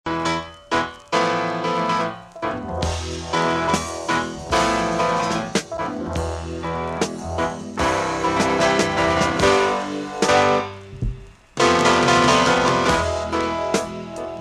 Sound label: jazz; catalonia concerts